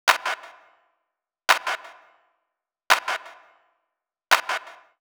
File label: 085 Hip Hop Slapback Clap, Percussion; Digidesign; Pro Tools; Pro Tools Essential Loops